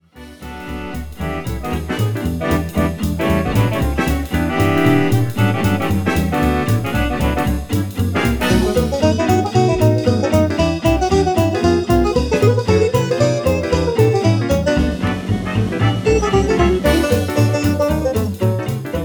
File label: Blue Bell Makes Me A Pallet, banjo, bluegrass